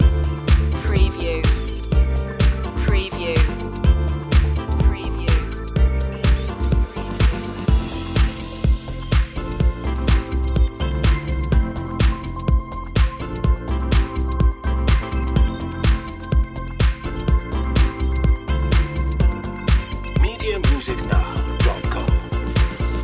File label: lightly grooved loop